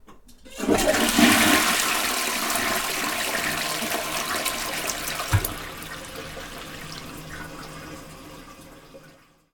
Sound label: flush in toilet, 洋式トイレ; 洋式トイレ１; wetarea